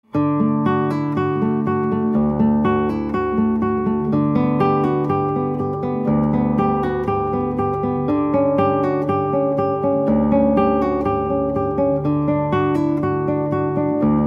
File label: Solo Guitar; Prelude in D